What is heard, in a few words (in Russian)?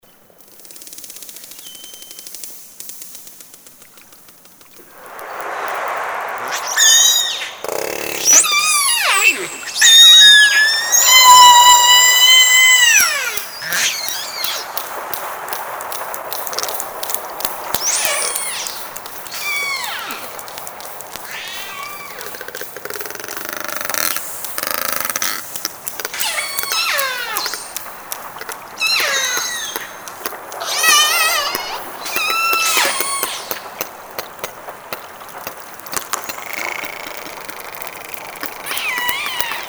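касатки Авачинского залива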